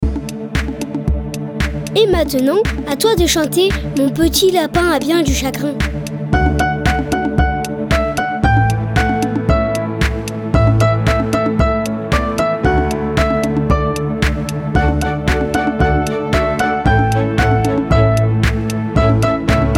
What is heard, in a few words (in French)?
Mon petit lapin a bien du chagrin Instrumental, comptines pour les tout petits; Livre Audio – Comptines pour les tout-petits